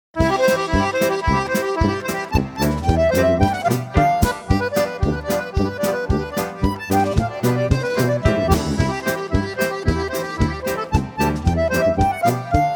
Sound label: Polka